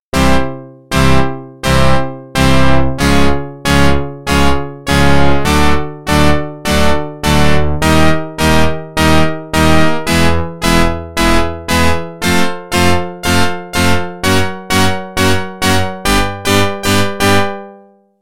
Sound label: Organ; NHL 94